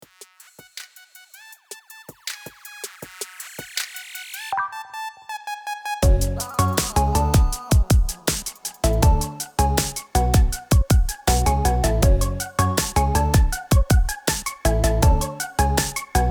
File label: ringtone; hermes